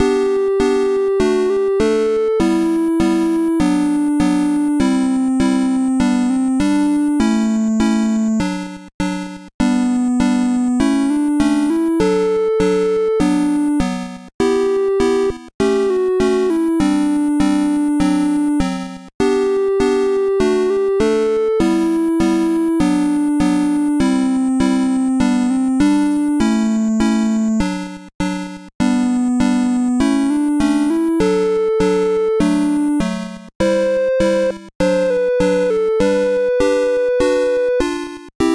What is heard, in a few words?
pomp